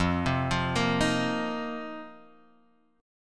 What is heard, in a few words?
F 7th Suspended 4th Guitar Half Step Down, chord